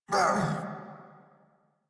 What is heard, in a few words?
Pain; Warframe; Eidolon Grineer